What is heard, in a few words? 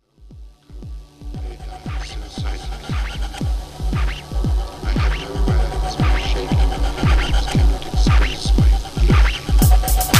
Trap Trance Mix